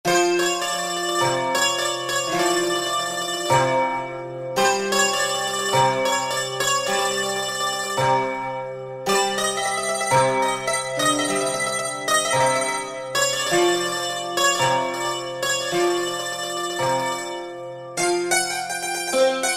Euro Zither; World; Roland SR-JV80-05

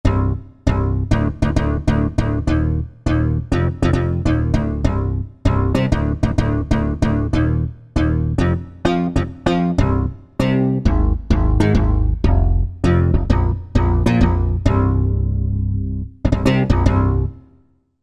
New Bass, Korg MSC-14S; Korg MSC-14S Sound Effects

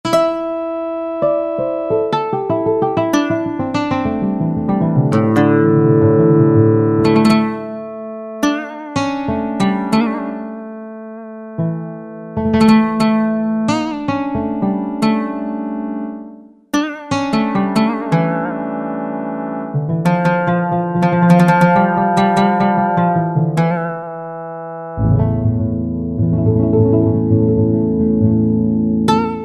Oud; World; Roland SR-JV80-05

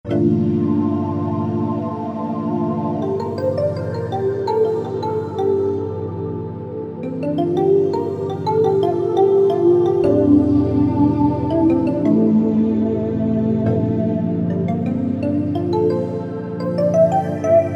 Cool Pad; Generalmusic Equinox

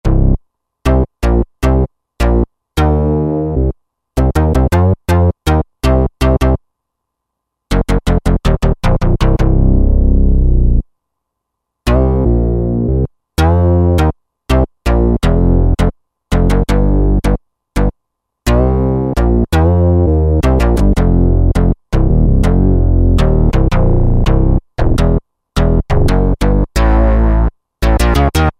Nasty Man Bass, Roland JD-990; Factory Patches

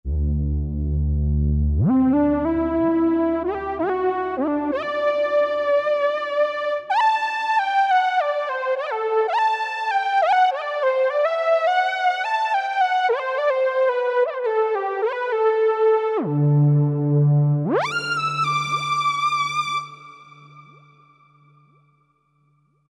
Soft Jup' LD, Roland SRX-03 Studio SRX

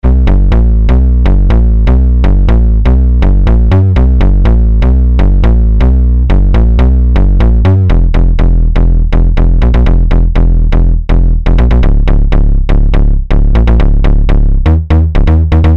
Monsters, Basses; Green Oak Crystal

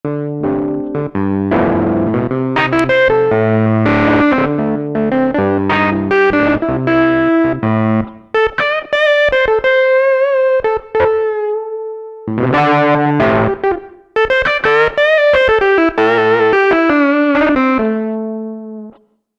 Jazz N Rock

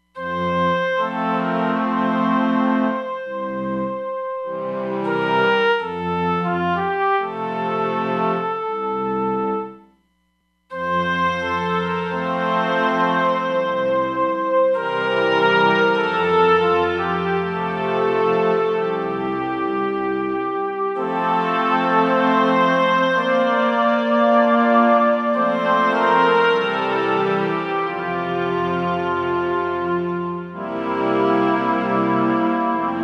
Concert Hall, Roland DEP-5; Factory Patches

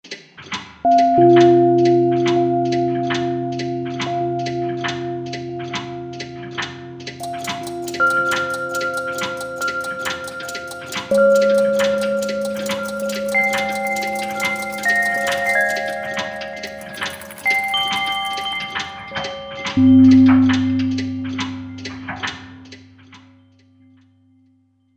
Clockshop, Korg MSC-8S Percussion

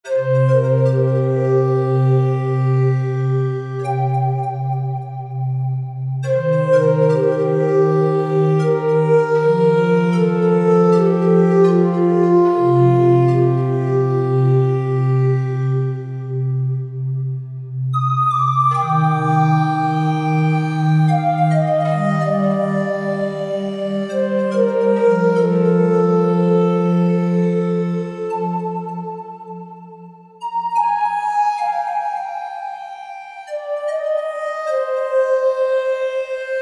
Breather, E-mu Proteus VX

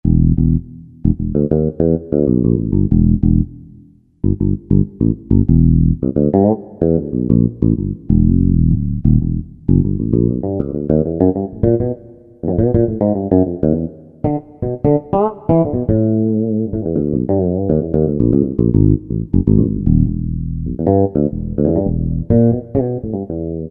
Korg T3 E.Bass

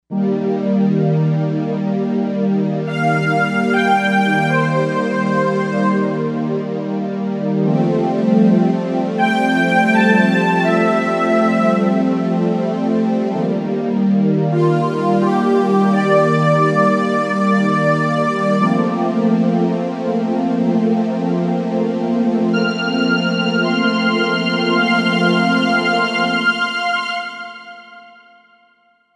SynVoices, Korg M1; Factory Patches

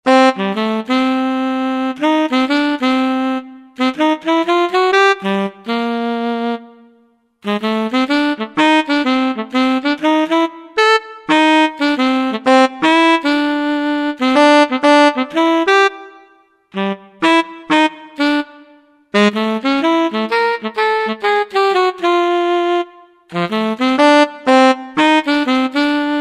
Super Tenor, Roland SR-JV80-09; Roland SR-JV80-09 Session

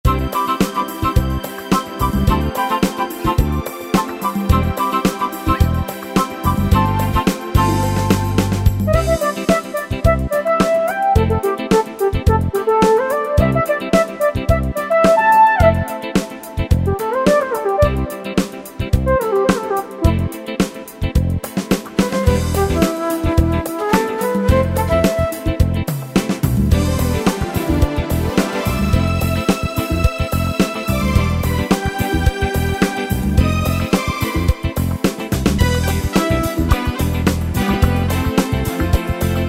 Disco Hustle, Music Finder Styles; Yamaha PSR-3000

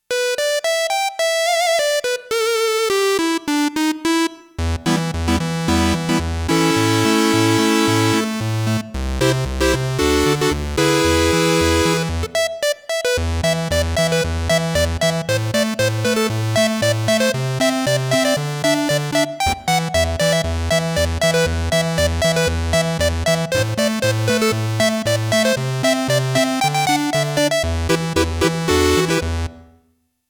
SQUARE, Roland JX-1; Factory presets

